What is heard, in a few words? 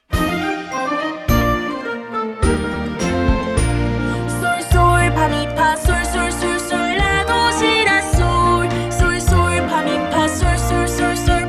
scale